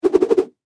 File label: Whip Whoosh Multi, Throw; Foley; Heavy